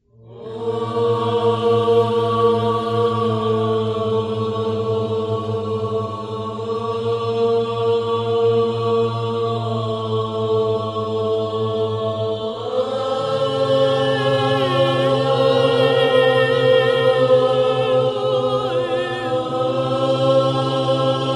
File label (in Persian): Vocal Tars